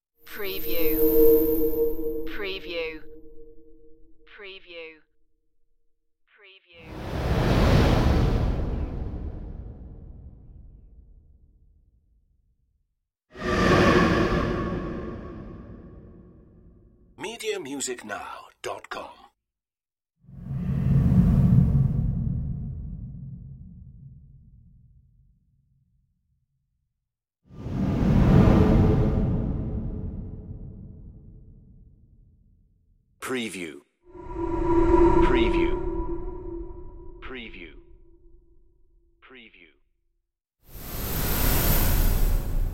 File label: space whooshes